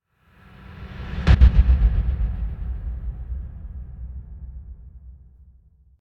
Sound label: Impact Muted Drum Echo Deep Hits; Hollywood Edge; sound designer's toolkit v2